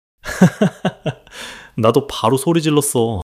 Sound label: laughter